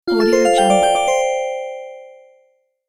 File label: notification alert